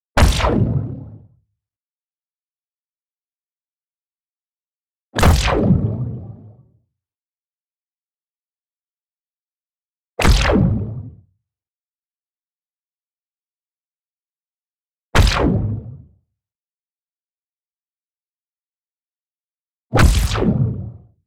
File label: Warp Rifle Energy Blast; Funky Audio Sonic Spices